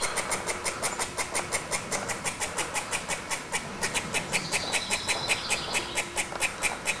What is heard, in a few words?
squirrel barking